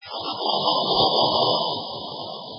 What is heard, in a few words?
sound bell